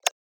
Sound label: Ui Series Tonal